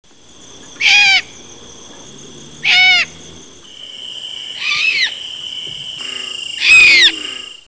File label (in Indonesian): suara burung hantu serak jawa tyto alba